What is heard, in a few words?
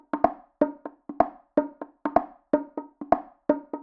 Call Me Parts Bongos, techhouse